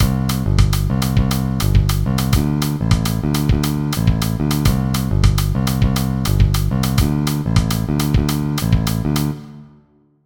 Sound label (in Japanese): ラテン ベース テンプレ